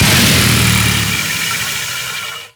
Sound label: wind down; weapons; tf2classic